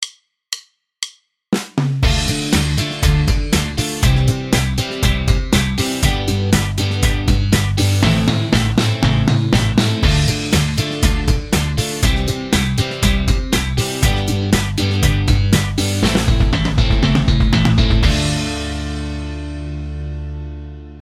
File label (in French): slap exercice, basse